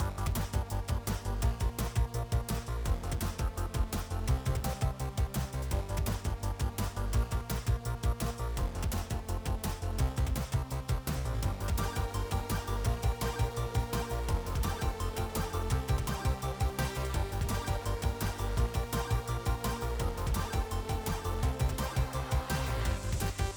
music; Ben 10 - World Rescue

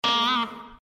fire held note release; Adventure Time - Elemental; ThrillGames

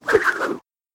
spin; Power Rangers vs Teenage Mutant Ninja Turtles - Ultimate Hero Clash 2

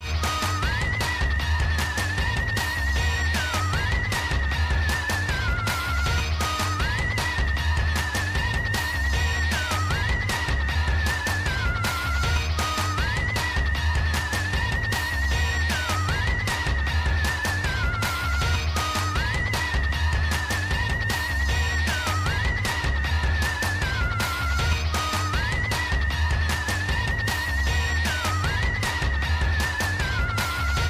background; Ben 10 - Heatblast Fight

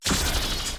charge Attack; Battle Day Brawl; ThrillGames

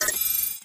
btn level; Ben 10 - Escape Route

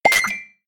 coin pickup; Henry Danger - Day Of Danger!

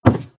thud; Crash the Bash - Drink Break

